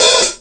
Hi Hat Short Close; GMPercussion; 3DO; Toolkit 1.5; GMPercussion22k

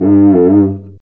Cuica Mute; GMPercussion; 3DO; Toolkit 1.5; GMPercussion22k

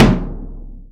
Acoustic Bass Drum; GMPercussion; 3DO; Toolkit 1.5; GMPercussion44k

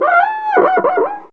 Cuica Open; GMPercussion; 3DO; Toolkit 1.5; GMPercussion22k

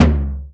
Low Tom; GMPercussion; 3DO; Toolkit 1.5; GMPercussion22k

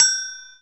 Agogo High Std; GMPercussion; 3DO; Toolkit 1.5; GMPercussion22k

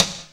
Side Stick; GMPercussion; 3DO; Toolkit 1.5; GMPercussion44k

